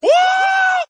woo

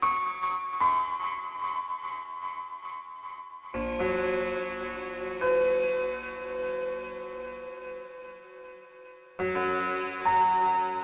keys, Sad Loops

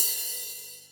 Hats Real Open

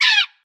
parrot; Animals

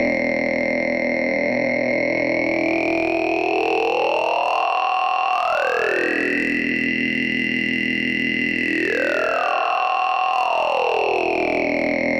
throat tingles, loops electronic

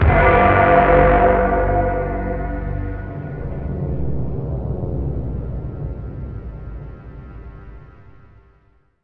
bad news sting

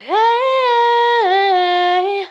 voice; Loops; Phonky

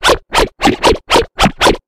martial arts scratching, Vinyl; Misc Percussion

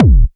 Kicks Electro

